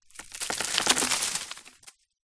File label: dirt very small stones debris handful drop log fall grass